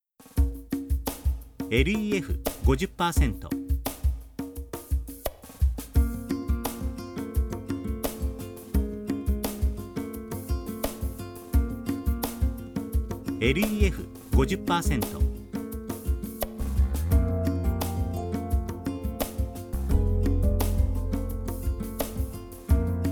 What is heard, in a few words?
perc